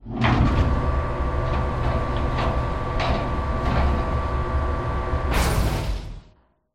giant shutter

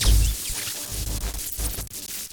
match card

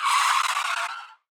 brake

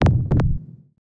heart

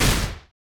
punch real

